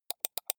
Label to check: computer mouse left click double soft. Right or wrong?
Right